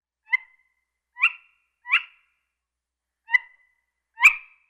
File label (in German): Saegekauz Aegolius acadicus; Vogelstimmen